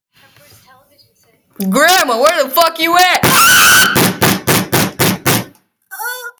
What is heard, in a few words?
grandma fell down the stairs